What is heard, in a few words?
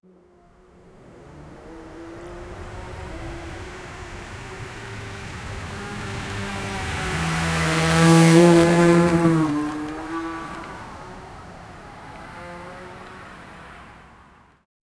the sound of the night, ambiance